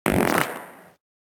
pain; Fight Knight

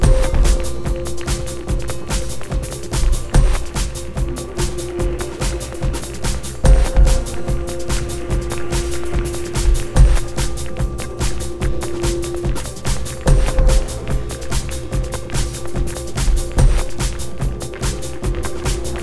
Music Canal; puddle plus ios gamerip 2018

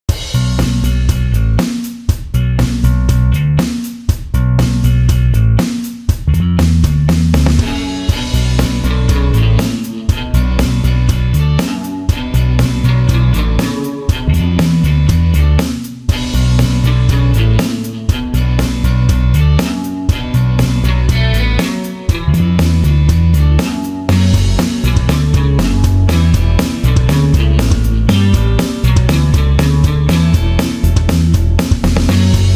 Generic Rock Loop; Niko and the Cubic Curse; Xbox Series X/S

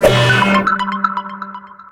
Boss Attack

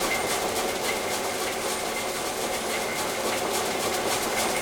Rumble Medium

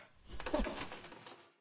Shitting large pause Music; Tom

